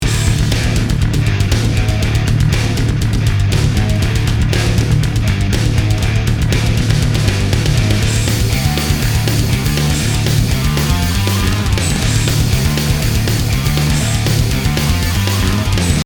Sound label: Mix rev room